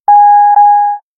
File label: warning; ZTE Nubia Z5S Mini; ui